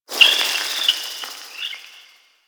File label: Interior Cave Critter; Warframe; Ambience; Duviri